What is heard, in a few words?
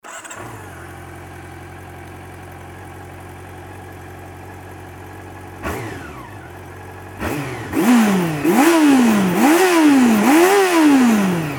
cb400; cb400sf